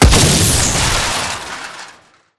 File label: bullet generic exploding head kill shot android; Titanfall 2